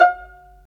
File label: Vas Pizz; STR Vlas Pizz